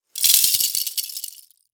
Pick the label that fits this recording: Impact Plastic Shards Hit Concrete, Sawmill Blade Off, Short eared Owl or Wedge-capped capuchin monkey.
Impact Plastic Shards Hit Concrete